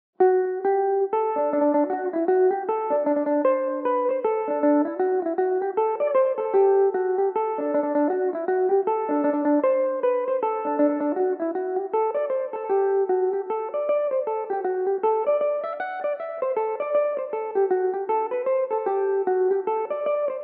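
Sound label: D mixolydian, irish mandolin, tunes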